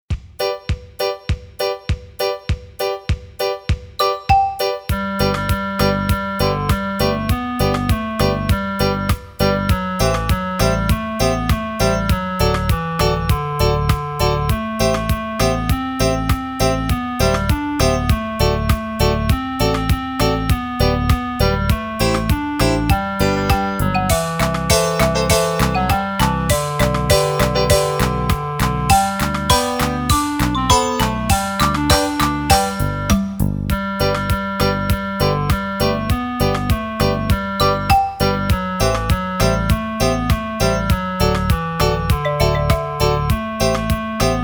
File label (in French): VERSION KARAOKE